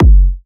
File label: Techno, Kick; Techno Sounds 2024